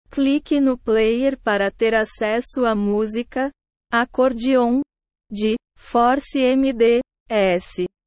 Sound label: accordion